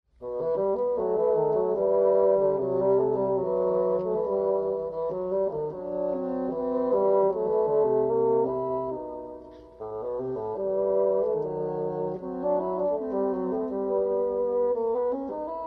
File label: duet